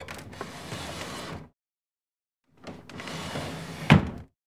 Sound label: Wooden Drawers, Open, Close; lilesound